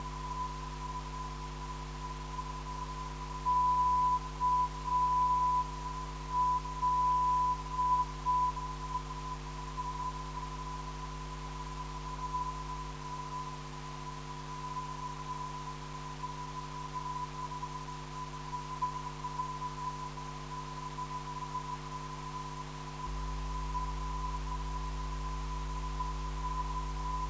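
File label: non-directional beacon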